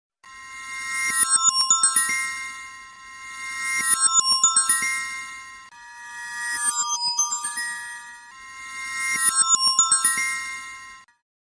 Futuristic Digitone

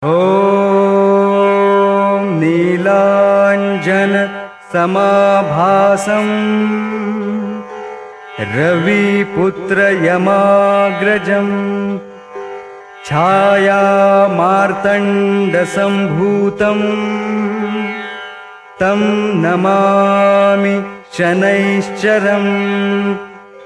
Shani Maha Mantre

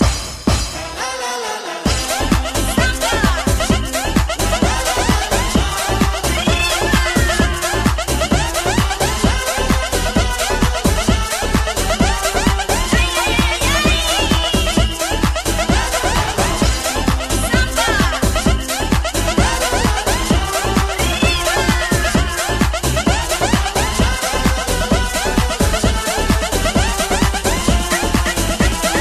Clubmix

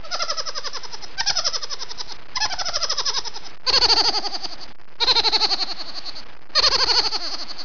Funny Mouse Laugh

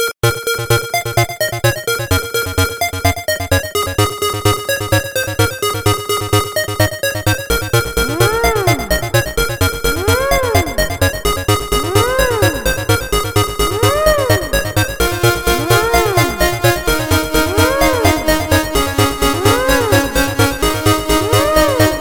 Electro

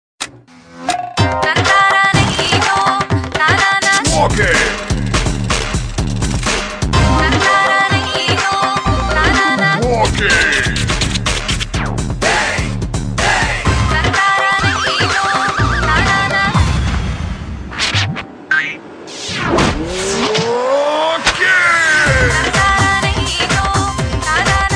Nokia South Mix Tone

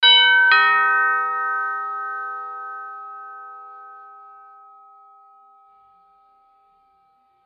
Doorbell Sms

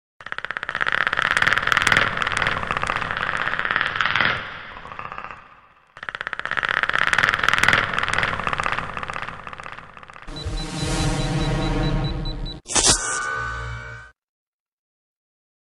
Predator Sound

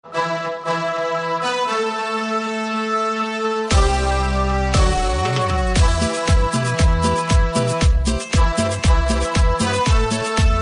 cumbia

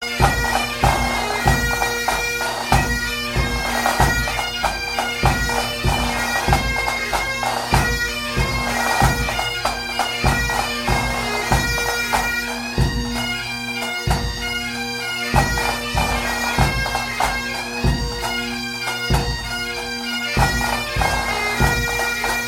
Bagpipes

